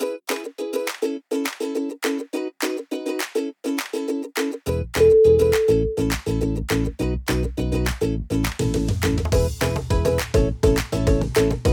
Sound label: upbeat and cheerful